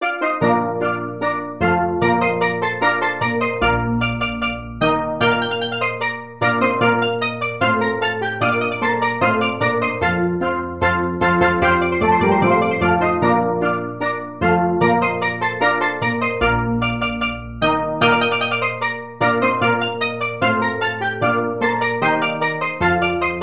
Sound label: fanfare rondeau for steel orchestra